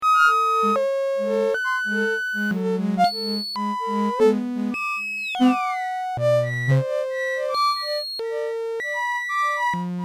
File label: reverse wavelets; beepology